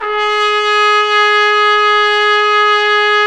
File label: BRS TPT F; Roland L-CD702